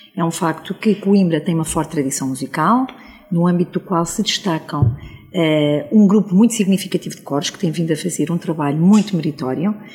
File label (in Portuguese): CORO